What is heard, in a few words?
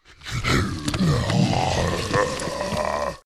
zombie attack